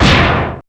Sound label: NOISE MAD